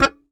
Very Short Note, Melodica

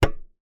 Key Noise, Melodica

